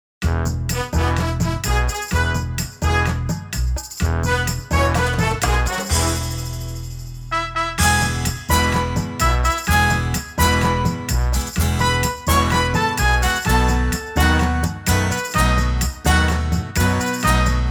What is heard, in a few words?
happy birthday karaoke